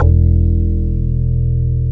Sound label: WOOD BAS; Synclavier Sampler Library; KEYS AND GUITARS